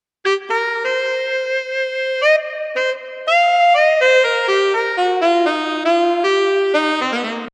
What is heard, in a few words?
lead